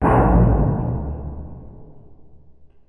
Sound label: big clank